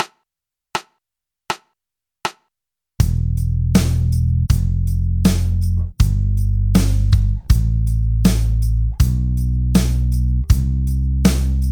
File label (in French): gamme maj NOTES 80 Bpm